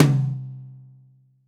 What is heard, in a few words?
New Jazz Tom Mid, Roland BK-5